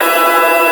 GT STROKE, Akai MPC2500

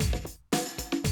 Classic Drum Loops

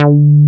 JNO TEK D4, TEK BASS; Drums In Your FACE!

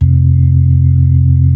B-3

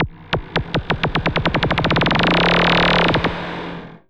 ROLLING, Anime Sound FX, SCIFI

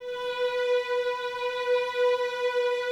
violins B 4, String Ensembles, 23 Violins; Miroslav Vitous

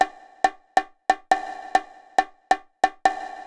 CONGA, Techno 138 BPM; Best Service ProSamples vol.54; AKAI; HI ENERGY

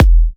F, kicks; markus hakala kicks

